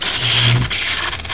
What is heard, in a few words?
tesla coil fire